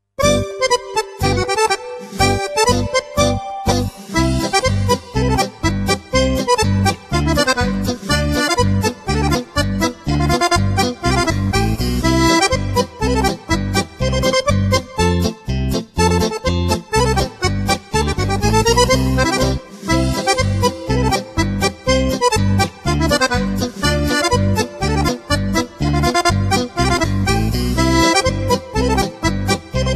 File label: polka